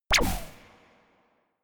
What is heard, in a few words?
space laser gun bubble shot forceful; krieg-waffen; soundpool